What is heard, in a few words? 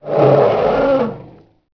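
warrior die, bugs; Starship Troopers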